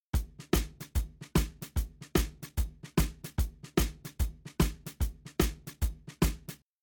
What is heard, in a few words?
Drums, shuffle drums; WDR2